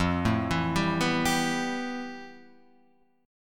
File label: F Major Sharp 11th Guitar Half Step Down